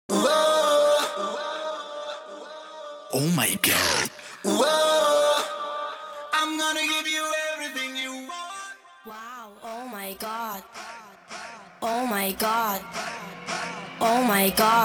DJ TOOL 110 BPM

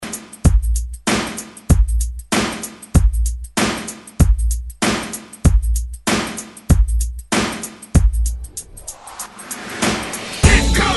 Cherish 90s Hype Intro Outro 96 Bpm

